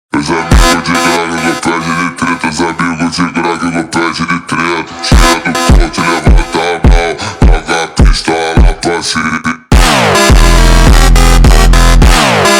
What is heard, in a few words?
(Slowed + Reverb) [BRAZILIAN PHONK]